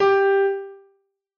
noteblock g; VoxeLibre; REDSTONE; mesecons

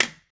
noteblock hit; VoxeLibre; REDSTONE; mesecons

